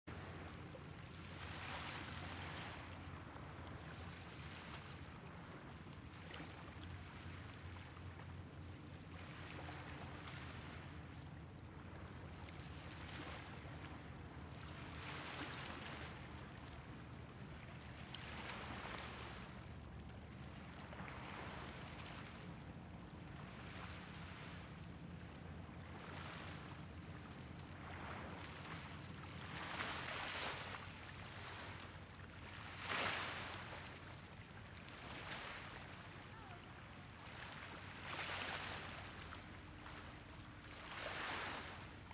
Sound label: Sea Waves